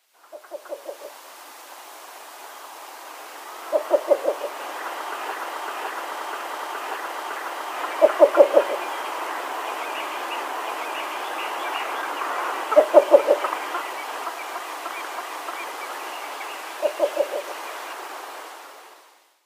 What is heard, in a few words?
Least Bittern male display